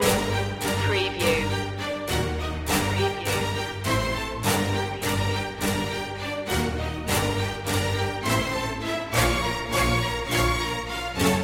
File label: strings only